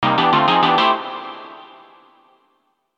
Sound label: sound logo